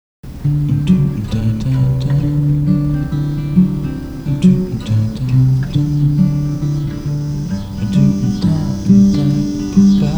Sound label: Pickin with the Cicadas